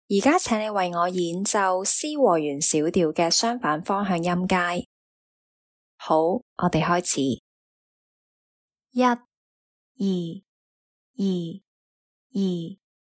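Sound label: C harmonic minor contrary motion scale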